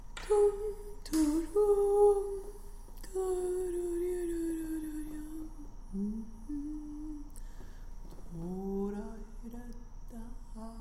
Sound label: tango